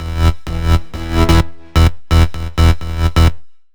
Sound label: 128BPM Melody; Vengeance Samples; Destination Kit